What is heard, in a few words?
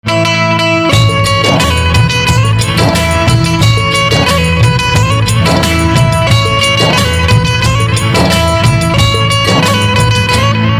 Banjo